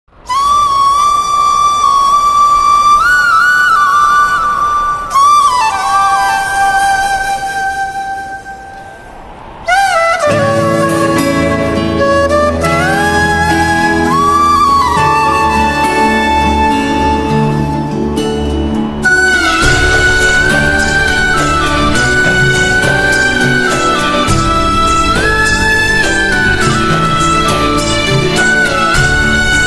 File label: romantic Clear Flute Music; Uruvi Karn; Karn Sangin; STAR PLUS